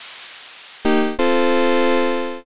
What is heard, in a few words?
challenger